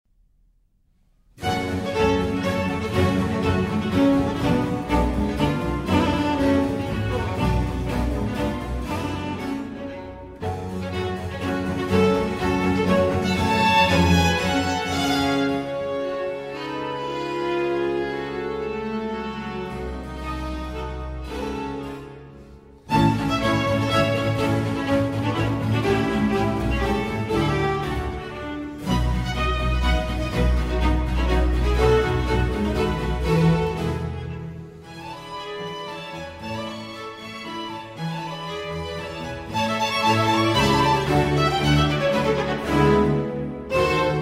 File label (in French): Concerto en sol mineur; op 10 n6